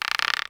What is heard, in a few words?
rope creak; WAR